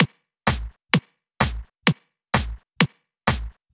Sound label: Drumloops 128BPM